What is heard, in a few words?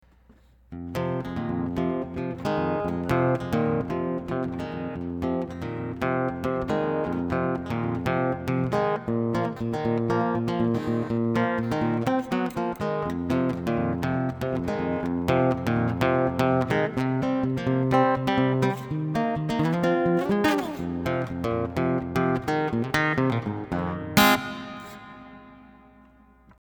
Guitar Stuff Mid